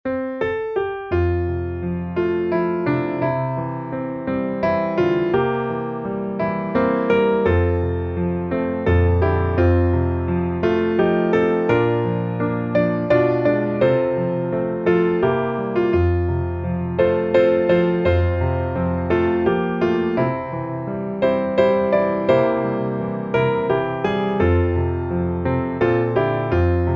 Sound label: piano